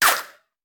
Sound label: Bullet Ricochet; Sound Ideas; Guns